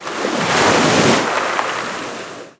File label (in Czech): Vlny; wob2 demo